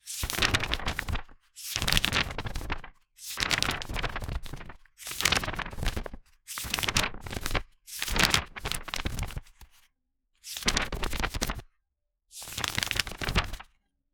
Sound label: laminated photo album; activities